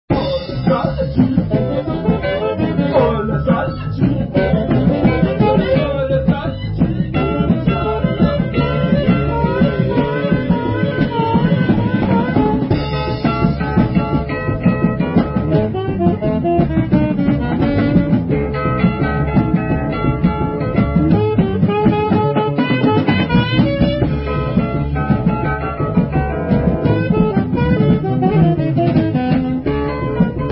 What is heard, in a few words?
lofi; broadway; oral